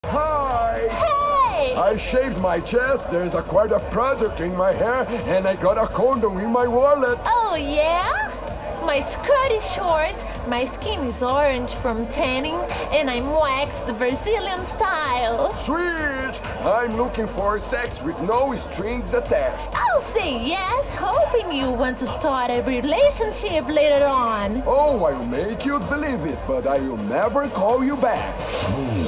Pub Car Boomdance; gangstar rio city of saints

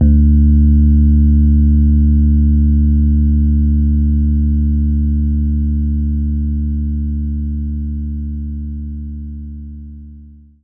Rhodes D1

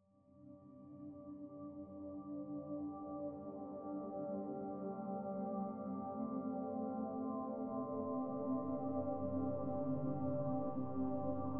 Whale Cave; Never Alone

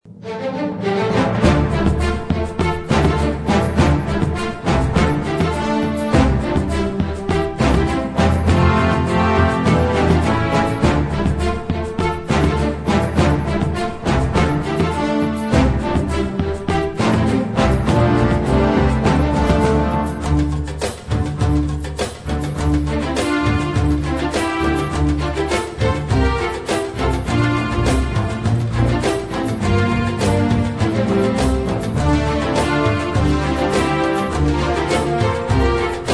battle bg; Strong World D; Android

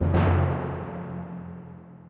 Tymp

